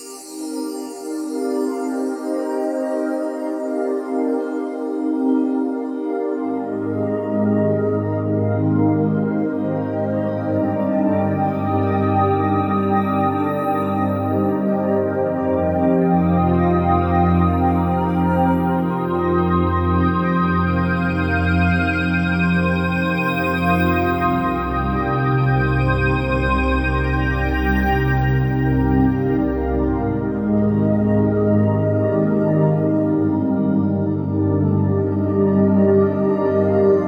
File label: Some Dreamy Place Looping; fantasy